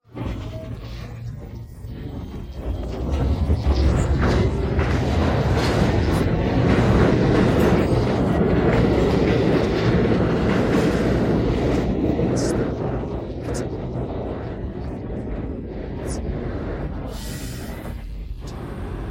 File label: Subway Test Recording no voice split